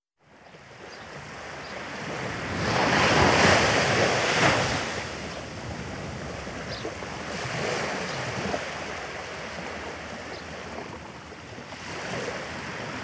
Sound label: Waves Breaking Along the Shore; Dr. Sound Effects; 210 Nature Sounds - 20 Hours of Relaxing Natural Ambiences for Meditation and Sleep